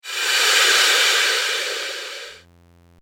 Corridor Ghost; Ghost Sound Effects; horror sound effect

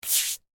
Household, Cleaning, Brush, Scrub; BlastWave

